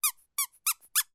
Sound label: toy squeaky squeaks